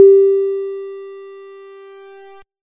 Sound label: ladder effect fade; Example scripts for the SAU language, and audio files rendered from them using the saugns